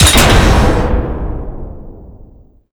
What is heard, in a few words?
path blocker open